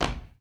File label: Body Perc; Spectrasonics; Supreme Beats; World Dance